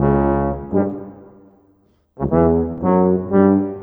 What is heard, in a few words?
Trombone Tuba; Sonic Foundry (Sony Creative Software); Orchestral Series VOL-4 - Rock and Pop